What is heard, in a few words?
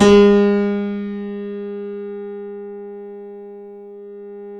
C7 FF, The Ultimate Piano Collection; East West